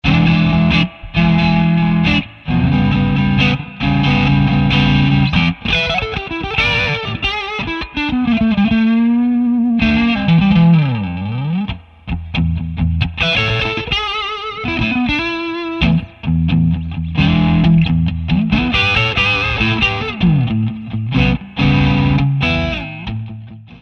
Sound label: Drive Boooster Bluse mono